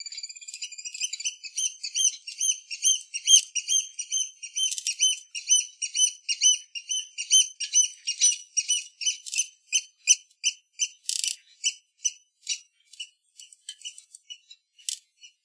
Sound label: Sailing Bird; Gated